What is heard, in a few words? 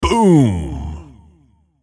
bones; Dice World